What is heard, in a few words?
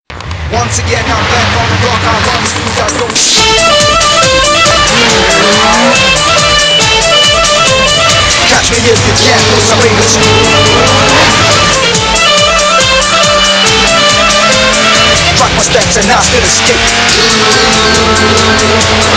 Dhoom Bikes